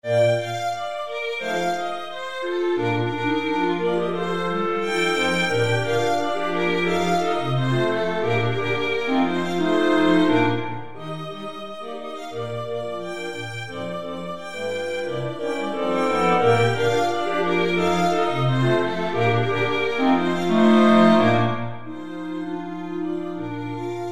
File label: yule log